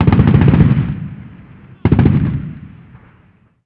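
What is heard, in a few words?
gun assault medium; Call of Duty Modern Warfare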